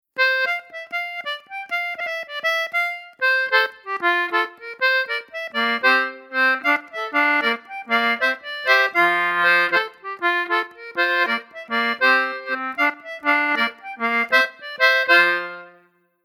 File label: swaggering, morris; morris contemporary